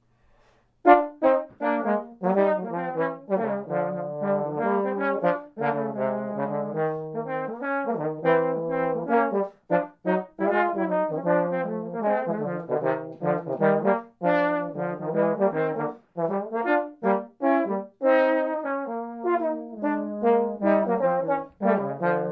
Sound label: jazz duo